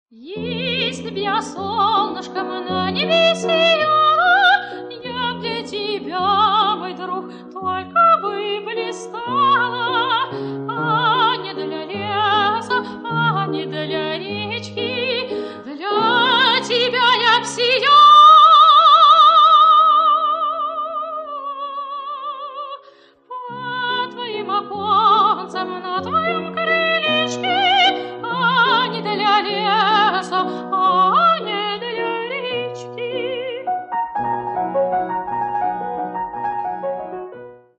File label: vocal miniatures